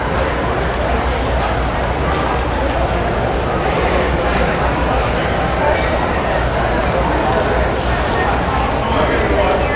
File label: BIG MALL, Crowd; 1000fx